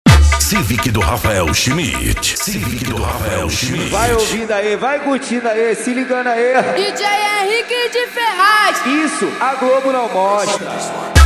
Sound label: funk mega bass